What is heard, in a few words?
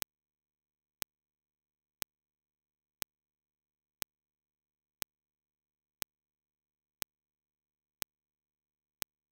impulse train